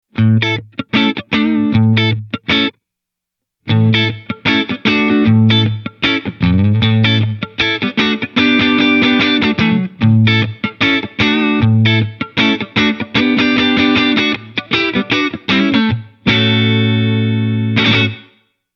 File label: Plate Classic Short, reverb